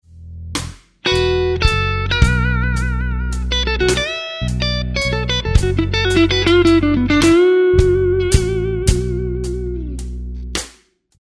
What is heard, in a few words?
Lick, guitar; Slow Minor Lick 14; Minor Blues licks